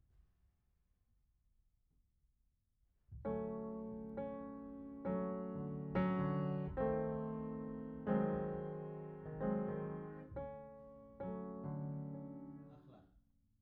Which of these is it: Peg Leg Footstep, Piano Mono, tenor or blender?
Piano Mono